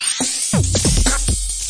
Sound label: 140 bpm, electro offbeat bendy, drum loops and breaks